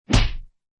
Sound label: body hit sound effect; cutter